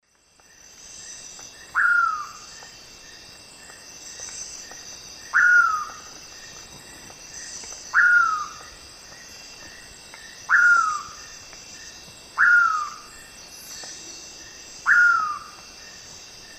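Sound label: Nyctiphrynus ocellatus, song playback; S. America; Eastern Lowlands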